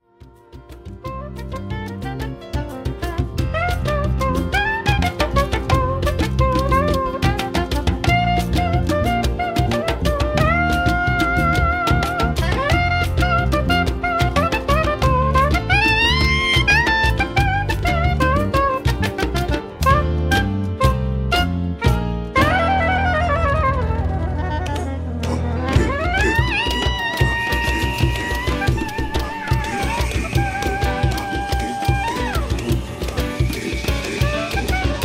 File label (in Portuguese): sax soprano solos